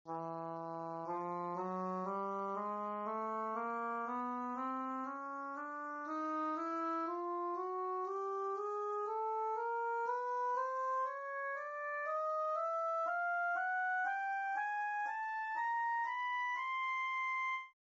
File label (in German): Englischhorn; Instrumentenkunde